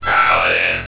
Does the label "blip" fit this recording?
Yes